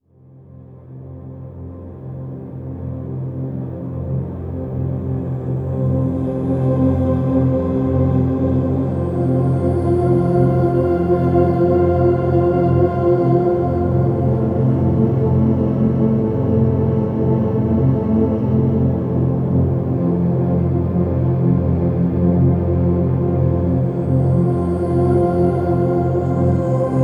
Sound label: Low Complex Voice, Complex Wave; Sonic Foundry (Sony Creative Software) - James Johnson Spektral Minimalism